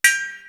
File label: AGOGO 1; East West; Global Sounds